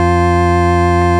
organ, keys

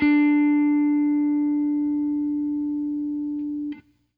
FENDER SFT, Guitar & Bass; Quantum Leap

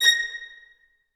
A5, Orchestra; AKAI Advance Orchestra Upgrade 97 Vol.1

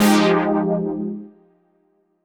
stab wob, stabs

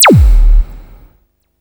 FX, Drum & Bass

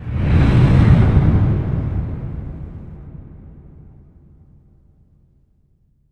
impact flame, Cinematic Impact; M-Audio Pro Sessions; Liquid Cinema

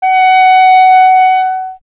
Clarinet F#; Miroslav Vitous; Solo Instruments VOL-1

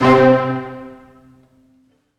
Brass 4 - C; 99Sounds; Monosounds; Music Loops; Instrument Oneshots

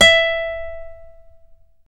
Guitar Classical E5, Nylon String; Roland LCDP02 Guitar and Bass

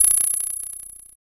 FX Analog PER; Sound & Vision - Gigapack II

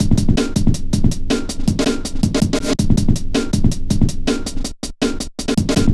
metallic stepz, drum loops

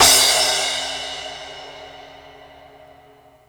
Crash Ball, Cymbal; KJ Sawka; Drum n Bass & Beyond; Drum Tool Box

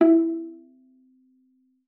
E4, VIOLA PIZZ; Xsample VOL-5

